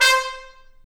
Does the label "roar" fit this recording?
No